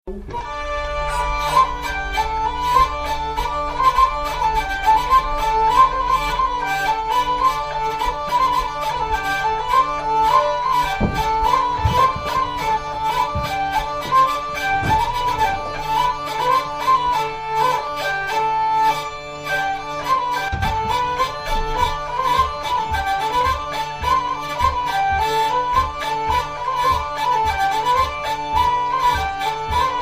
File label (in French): passepied, vielle; ploeuc